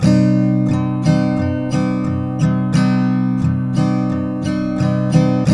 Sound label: MIN C#3, Guitars; Spectrasonics; Hans Zimmer Guitars VOL-1